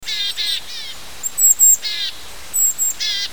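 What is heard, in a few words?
willow tit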